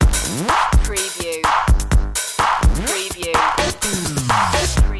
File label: glitch rocker